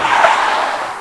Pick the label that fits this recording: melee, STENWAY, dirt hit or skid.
skid